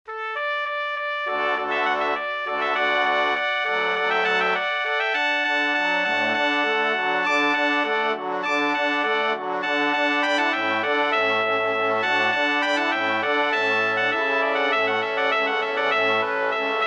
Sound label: Brass Quintet; La Rejouissance